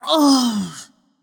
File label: nv ugh; smite; voicepack; morgan le fay